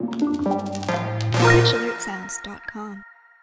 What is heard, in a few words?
arcade donation, Twitch Alert Sounds; Arcade Sound Pack